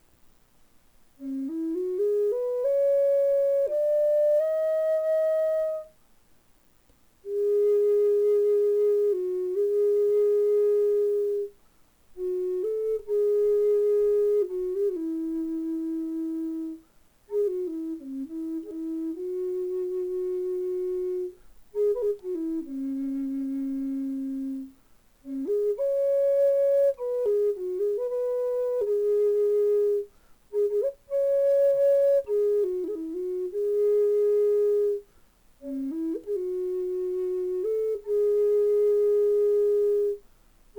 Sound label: sedar low C sharp, flutes